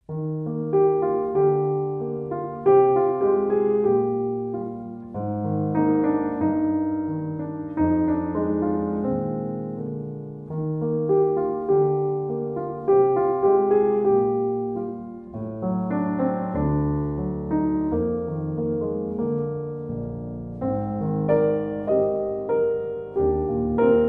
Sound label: Solo Piano